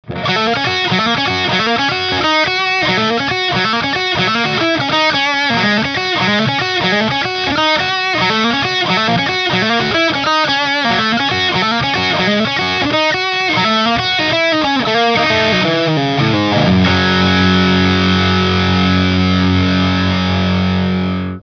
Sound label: Solo TS7 Hot, Ibanez Guitar Effects Pedals